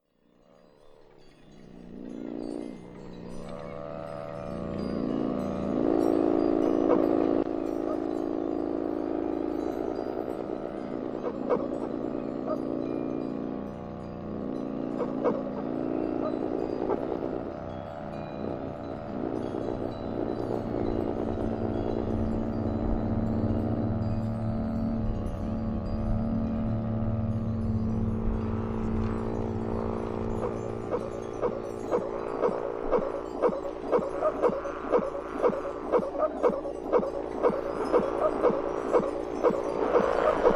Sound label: bow and rythm; Musique Eolienne; Ek bow and rythm